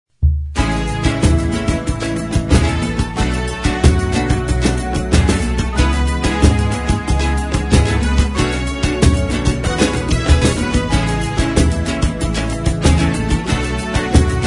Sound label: SAMBA